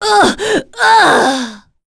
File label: Vox Dead; Pavel; kingsraid